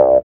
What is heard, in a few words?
SYN, dance; Sound & Vision - Gigapack II CD 1